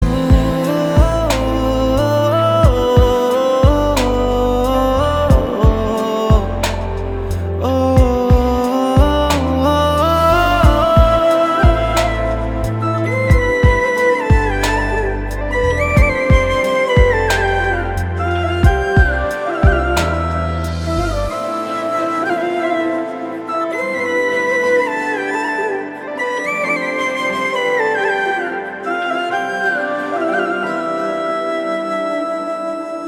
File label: instrumental ringtone